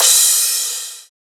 crash; osu

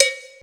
Wood; osu

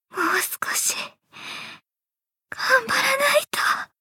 fail sound

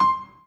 Piano Chord

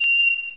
pipe

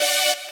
saw

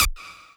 lead

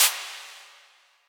handclap

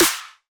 Snare Main

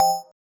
BELL

